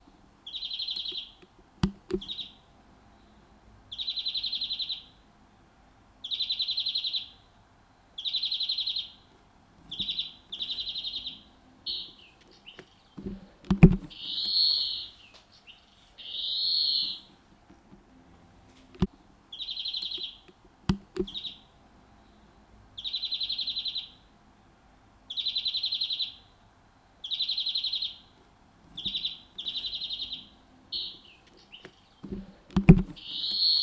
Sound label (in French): verdier d europe